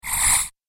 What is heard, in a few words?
Laser Motion Sweep Sound; Andrew Fleming